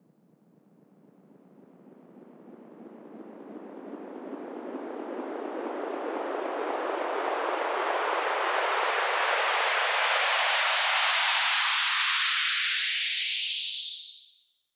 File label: White Noise Up; FX; Cymatics; EDM Starter Pack